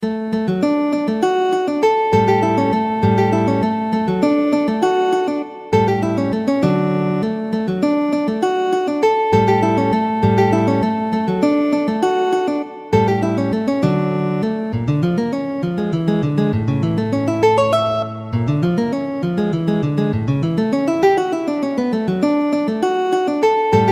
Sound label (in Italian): valses, guitar